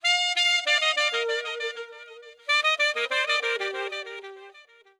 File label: OLDSCHOOL BRASS 96